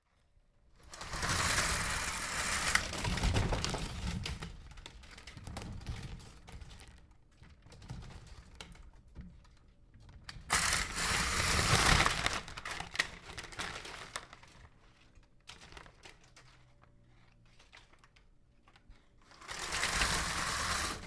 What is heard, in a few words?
blinds pulling up